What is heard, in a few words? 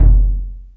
DEEP KICK; Back In Time Records - Big Bang